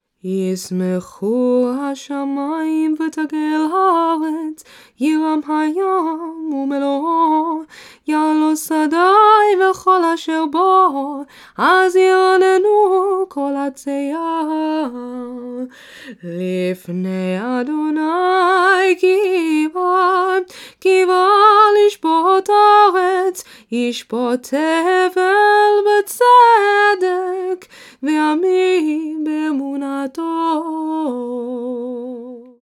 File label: Psalm 96 Closing Nusach; kabbalat shabbat